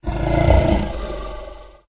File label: murgul attack voice up